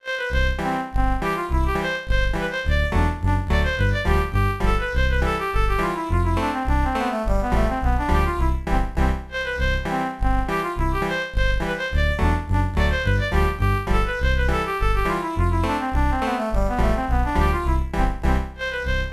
Sailor's Hornpipe; King's Quest III To Heir is Human; Apple IIGS; The Pirate Ship

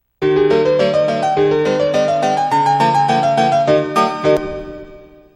Piano (twenty notes); Harvest Moon: Magical Melody

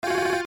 gun; End of Aspiration; android

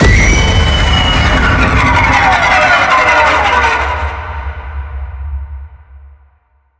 Jump Scare Impact; The Inn; Zara

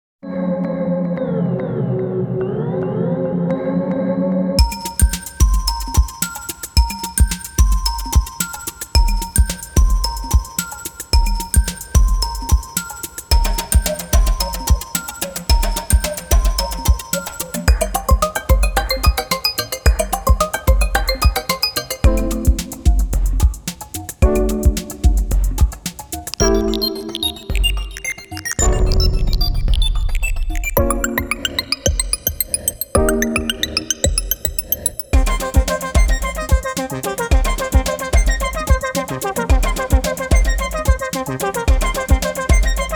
Electronica; Saiunkoku Monogatari OST 1; Iraira

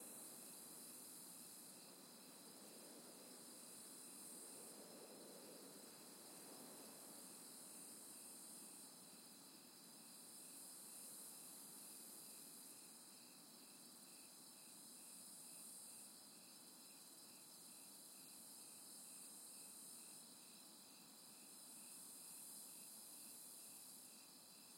Outside Ambience; The Town

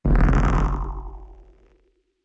burning pow

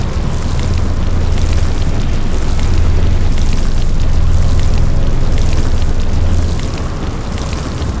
shadow create hold; Aragami

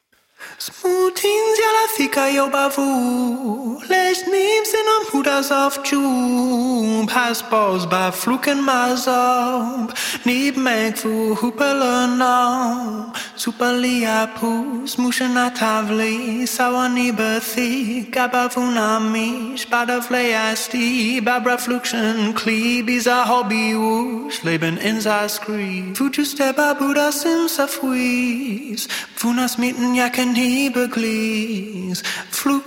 Vocals; The Sims 4; Sims Sessions

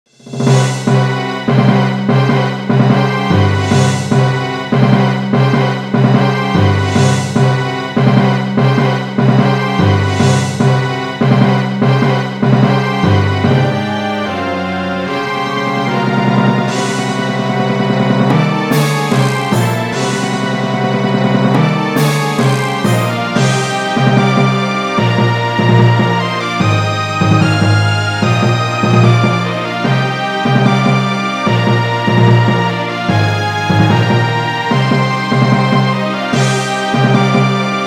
music quest battle

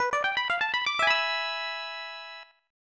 Unused Jingle; E.V.O. Search for Eden; snes

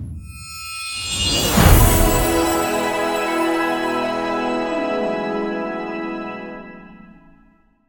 UI Score Arena Rank Big S

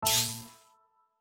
Sparkling Bomb Destroying; my cute commissar 2020 gamerip

